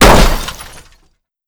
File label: jeep crash hard